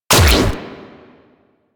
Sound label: Fire; Warframe; Acrid